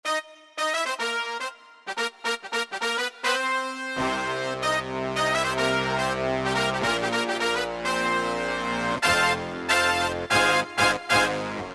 Octave Brass, Yamaha PSR-3000; Factory Voices

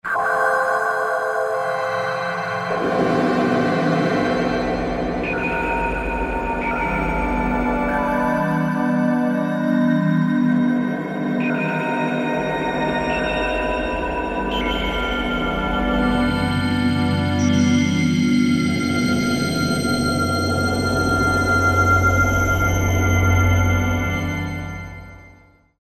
Fear; Factory Presets; Preset bank 1

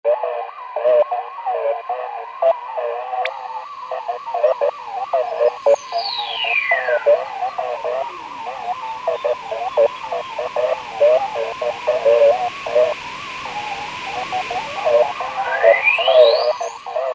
Radio, Roland JD-990; Factory Patches

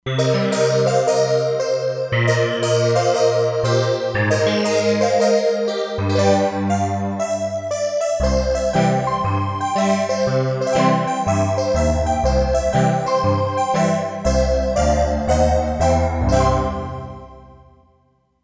Reso keys, E-mu Proteus-3; Factory patches

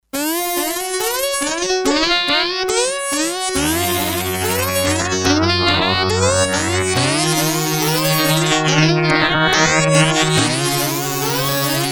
Musical Orgs, Sequential Circuits Six-Trak; Factory Programs

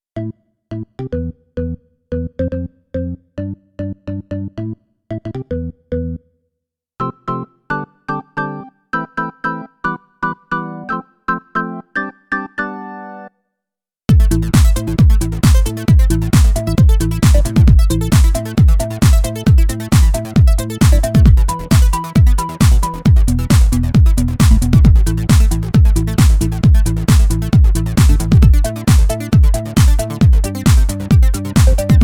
Organ Bass, Korg Radias-R

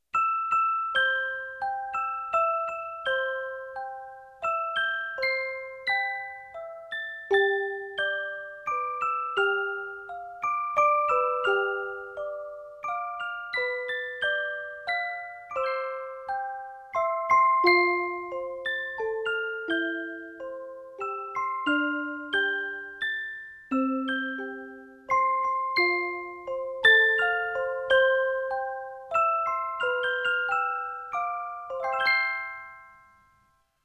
Music Box

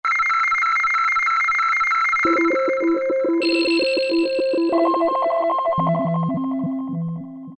interface; Latin And F.X. Percussions; Roland SN-U110-02

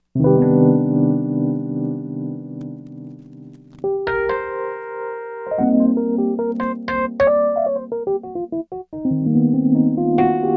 Sens. EP, Roland SRX-03 Studio SRX

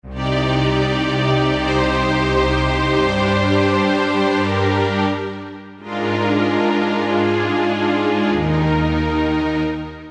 strings, Korg N364; Factory Combi demos

